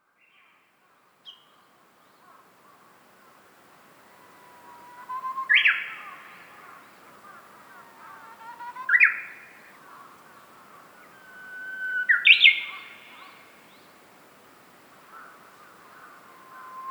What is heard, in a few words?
bird